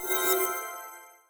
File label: Wireless Charging Started; RED Hydrogen One